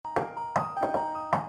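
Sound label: knocking; frozen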